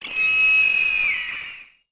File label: Eagle